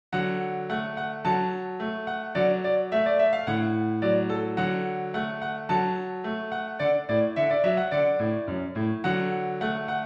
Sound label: Six Morceaux Musicaux no4 in D major